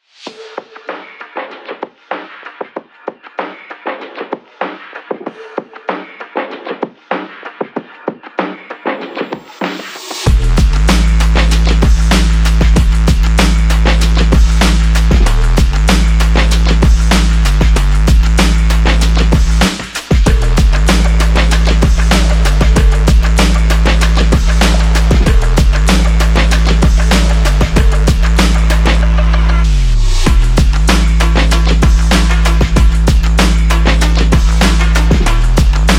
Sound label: urban speed